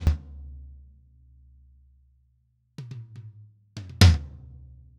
Toms R

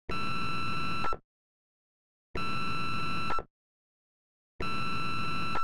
ally under fire

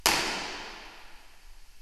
Impulse Resp A Mono, Delay

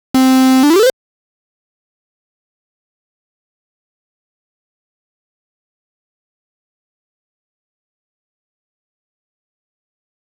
Toaster Rise and Reminder

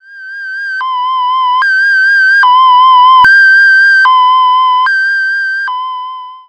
doppler

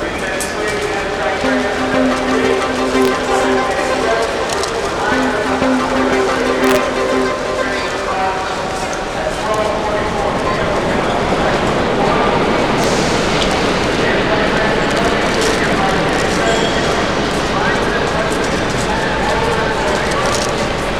Sound Generation

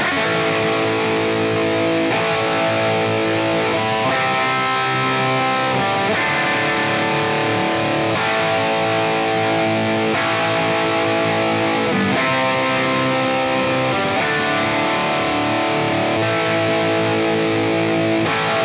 Guitar; Multiband Flanger; Dry signal; Wet effect

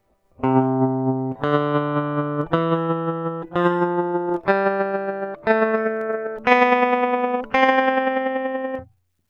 Cmaj asc; pos1 depth100

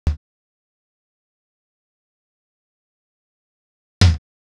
Tom s R

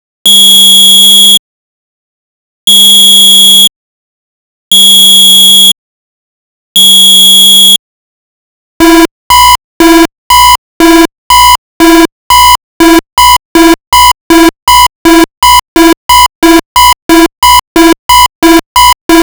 lockon torpedo chase

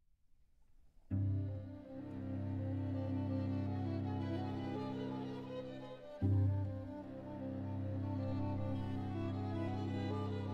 String Quartet; Harry Sdraulig

